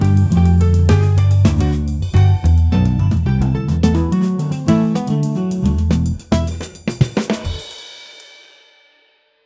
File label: instrumental yang modern